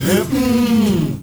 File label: MALE WAR, Heart of Africa, shout